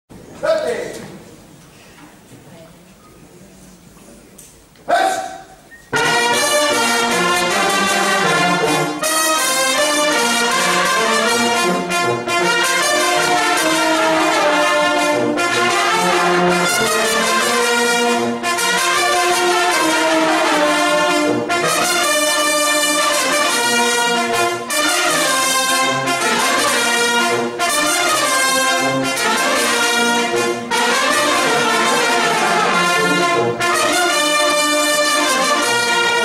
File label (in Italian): Fanfara; Fanfara Ass. Naz. Bersaglieri di San Donà di Piave